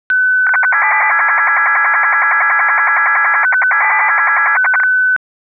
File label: synth